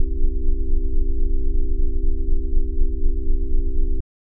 c major chord bass wheel imperfection, hammond

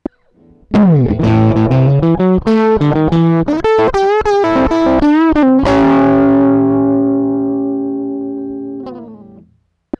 Tube Stage Crunch No Blocking

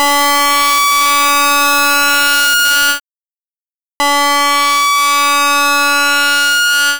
hard sync

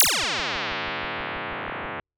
waveguide slinky

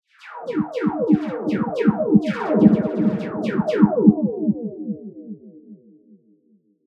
snare

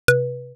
mallet unprocessed

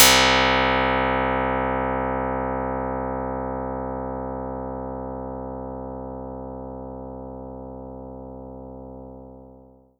string no mod

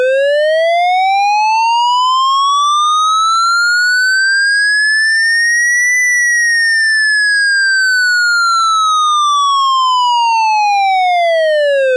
clipped polyBLAMP

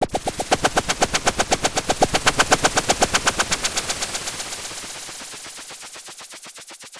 drum mix, 8Hz sine

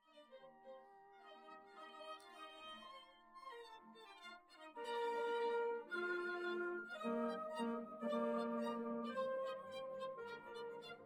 anechoic Orchestra -90deg

